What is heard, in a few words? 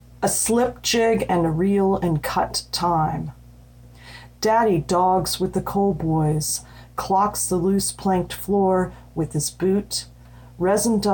slip jig